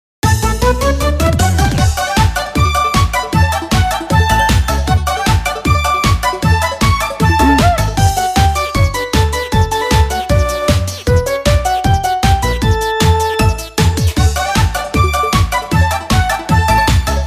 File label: Music event; Jikkyou Powerful Pro Yakyuu Success Special; PS Vita